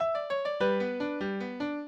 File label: minuet, mozart